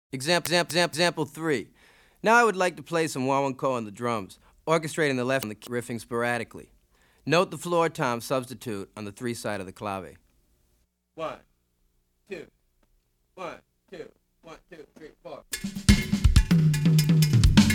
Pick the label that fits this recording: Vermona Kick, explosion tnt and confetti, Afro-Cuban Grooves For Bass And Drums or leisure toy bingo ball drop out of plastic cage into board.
Afro-Cuban Grooves For Bass And Drums